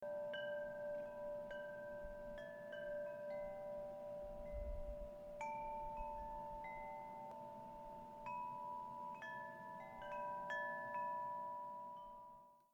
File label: wind chim